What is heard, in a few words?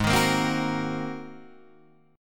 G sharp 9th, Guitar, Open D; down; chord shape